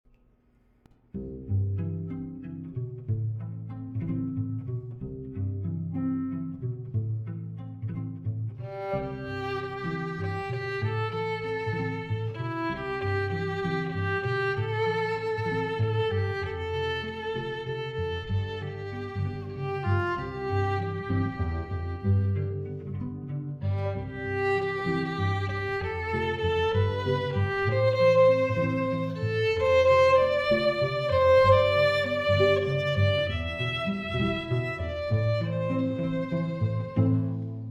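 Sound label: duo violin cello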